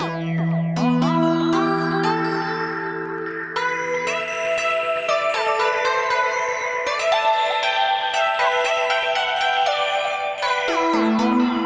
lead; atreoix; fusion dance for omnisphere by 12mx media

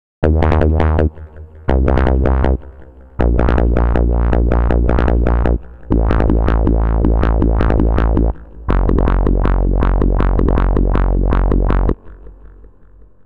gate 16 steps krishna bass; the meditation soundset

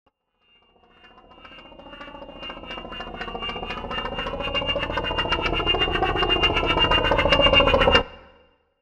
vital presets; chiqui audio; vital fx presets

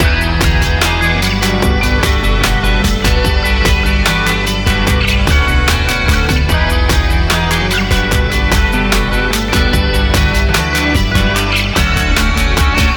full mix dry no airspace; airspace hybrid reverb and delay; modeaudio

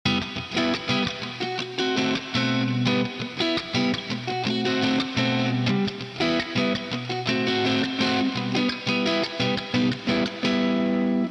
guitar dry; elektra magika

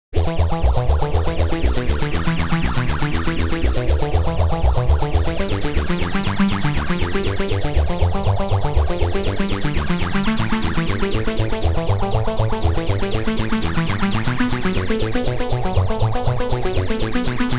mendelevium arp; the space soundset

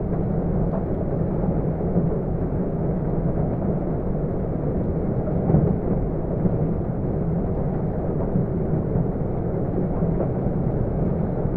filtered loop able big city; ambience and background fx; nova sound

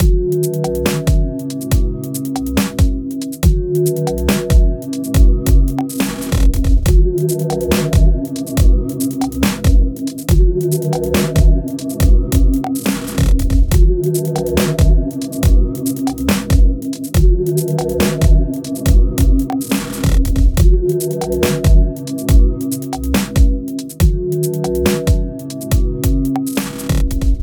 chorus; 23dsp

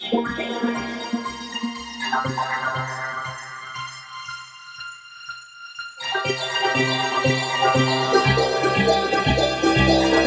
Cinematic Tension Builder; kaleidoscope

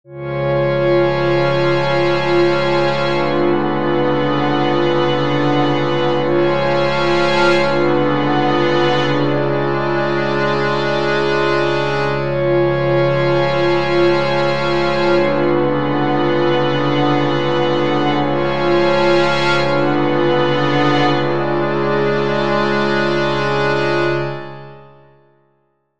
strings; fire by music sound software

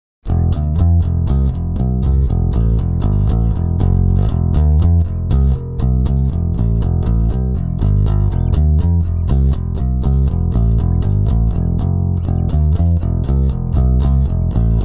di bass wet

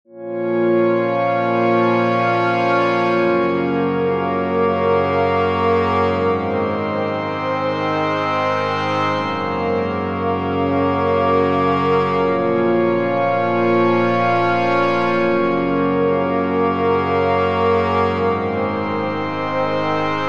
pad; fire by music sound software

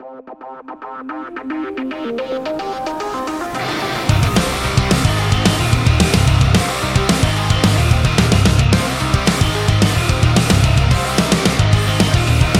buzzer bass; polarity audio

